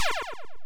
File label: MOVE; C64 Dreams; Multi-Sound Default